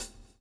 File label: SWNG